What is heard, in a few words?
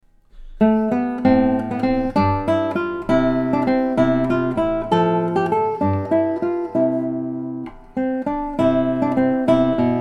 Spanish dance, guitars